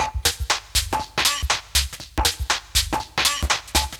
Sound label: groove top